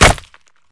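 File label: Bullet Light Ballistic Concrete impact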